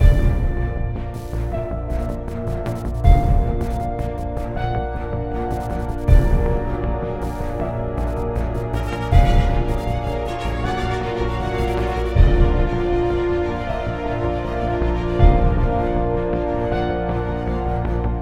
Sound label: Battle Loop; Infinity Blade 2